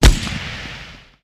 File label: gunshot mini; gfuel